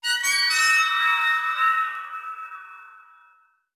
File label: Fall 2 FLOURISH; bolt